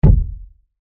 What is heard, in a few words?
Impact Wood Large, Heavy; Materials